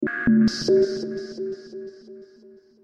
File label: Digital Fx